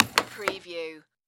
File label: Air Hockey Puck Slide; sound effects bible